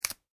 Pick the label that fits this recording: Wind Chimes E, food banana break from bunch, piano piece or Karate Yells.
food banana break from bunch